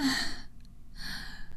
Moan

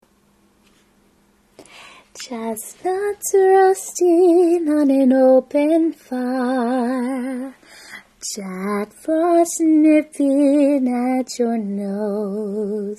Singing

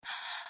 Breath; Lewd SFX

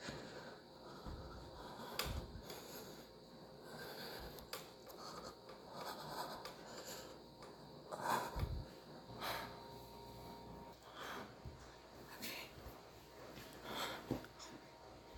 Hold the Moan, Heavy Breathing; F4A; F4M; i make a noise that s like half gasp half whim

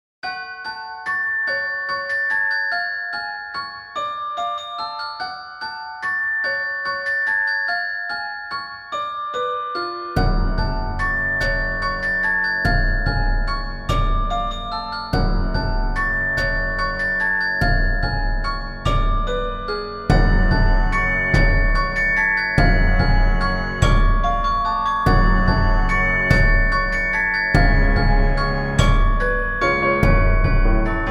Waltz

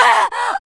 Grunt; Lewd SFX

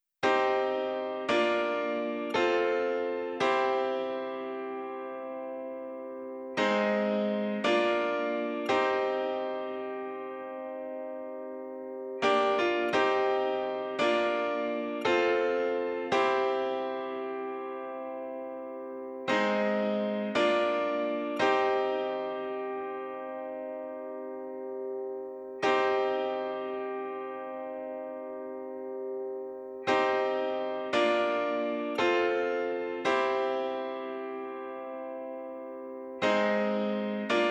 piano, 85BPM; Pony Fan Music Remix Stems

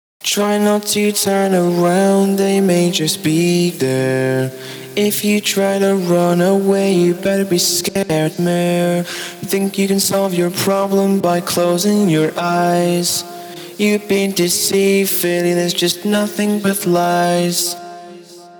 Vox, 105 BPM; STEMS

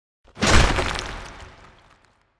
breaking wall

